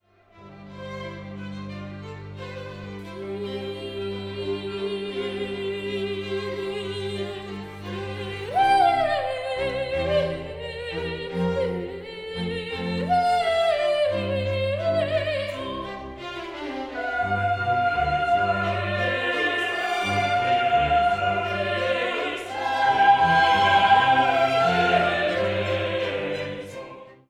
Kyrie Solo eleison

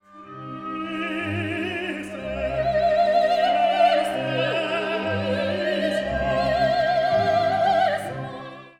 Kyrie Soprano Tenor

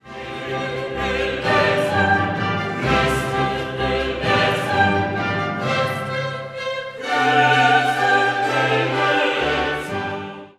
Kyrie Major key

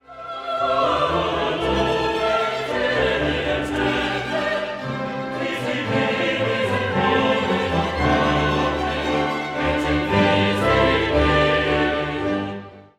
Credo factorem coeli

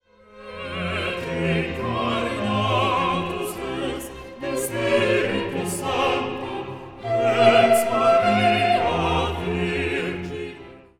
Credo Et Incarnatus

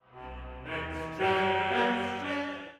Gloria Rising chord

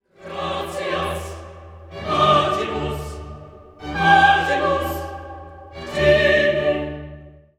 Gratias agimus

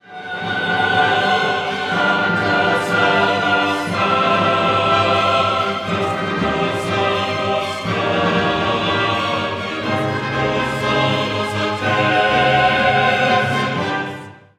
Gloria Quoniam

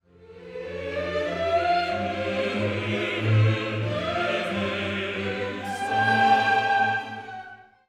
Kyrie Sopranos lost

